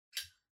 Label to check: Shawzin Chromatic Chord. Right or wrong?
Wrong